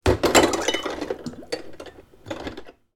household drinking glass drop into washing up bowl of water and dishes